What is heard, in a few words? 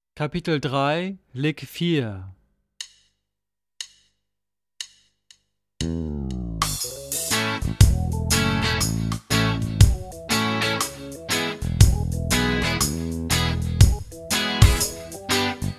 Latin Style Guitar